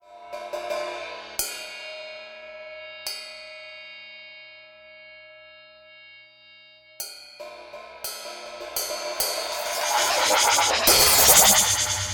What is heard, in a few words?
hihat